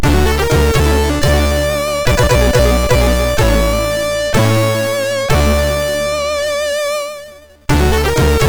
Fade In Intro, MOS6581R2

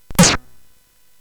Tecnetium, CSG8580R5; hvsc

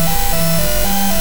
sid CSG8580R5; Donkey Kong 1983

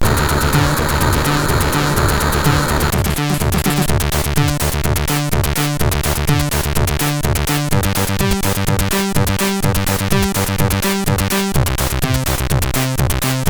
Techno Pupi, sid MOS6581R3

